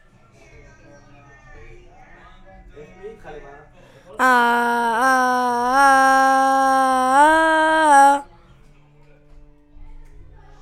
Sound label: VOC